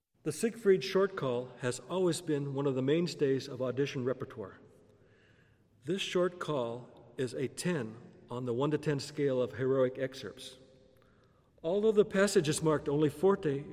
Horn Call; Orchestral Excerpts For Horn

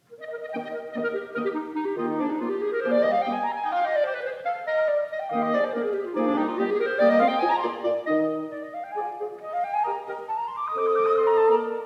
Quintet in E Flat Major, Wind Quintet

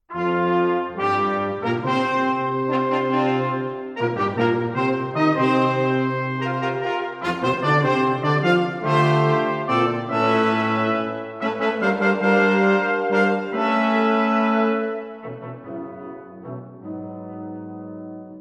Brass Quintet, Fanfare; Art Collection music of Arthur Frackenp

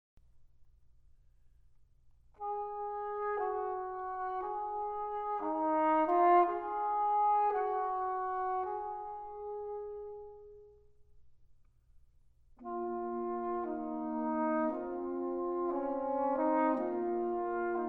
Brass Quintet, Melancholy

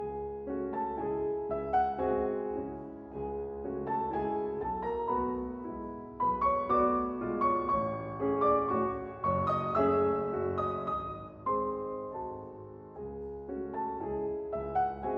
Waltzes, For Piano, four Hands - C-sharp Minor; Brahms

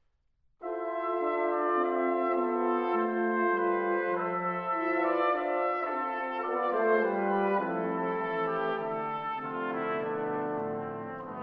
Quintet Andante, Brass

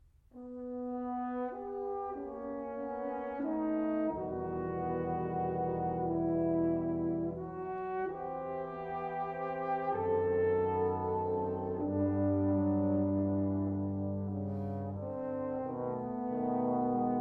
Symphony for Brass, Larghetto

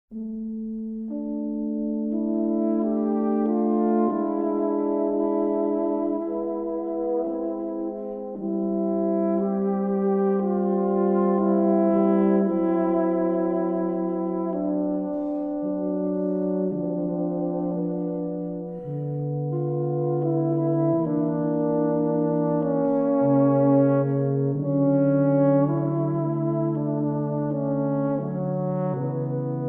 Profiles for Tuba Quartet, Reflective

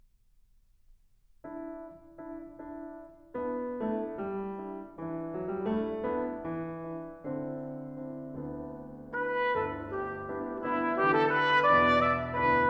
Vocalise, Trumpet Vocalise

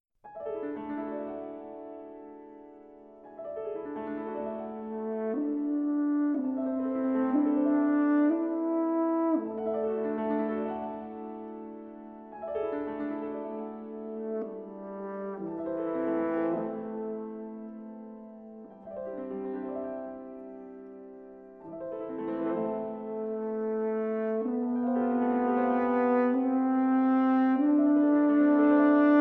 Sonata for Horn and Piano, Andante- Allegro molto

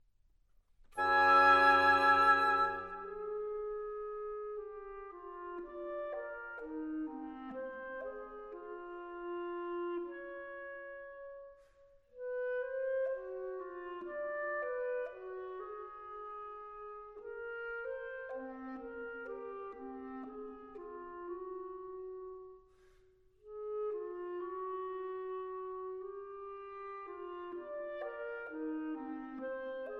Concertino For Trombone & Woodwinds, Pastoral

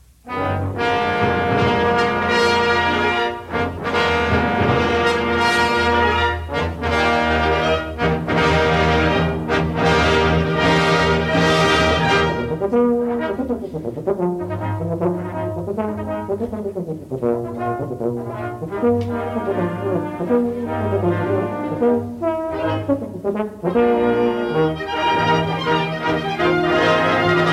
Horn Concerto No. 1 in E-flat

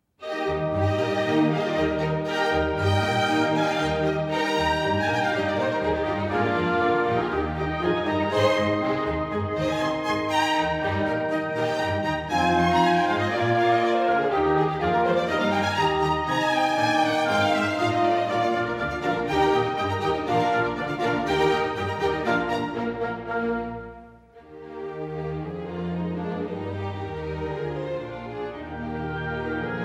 Horn Concerto, Allegro Maestoso; Mozart

